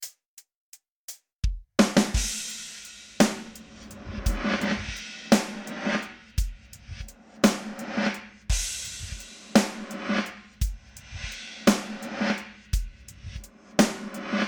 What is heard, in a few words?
drum akg true revers; halls of fame 3; best service